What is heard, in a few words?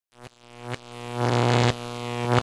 lightningrod voltage; Tanks